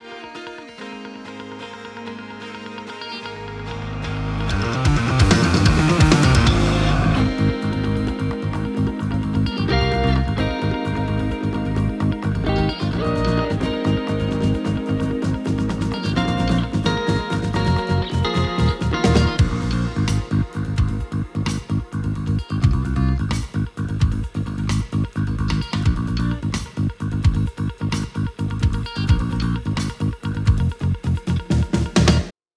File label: backing track 130bpm